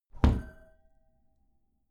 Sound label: glass door close heavy glass clang